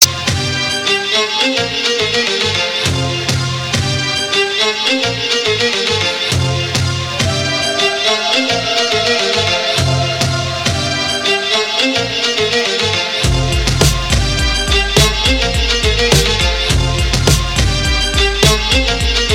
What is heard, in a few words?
Oriental Electronic